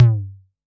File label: Analog SY1 Tom